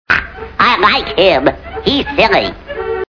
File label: gremlin